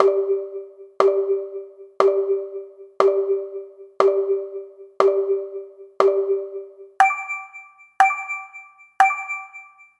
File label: SFX UI Wi-Fi Countdown; Mario Kart 8